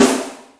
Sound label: Powerpic, Yamaha DTXpress, Acoustic Snare